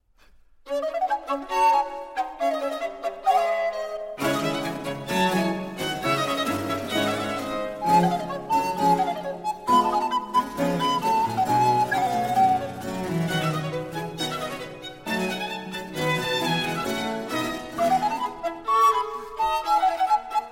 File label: Sonata for Recorder & 2 Violins in D minor, Allegro; Mancini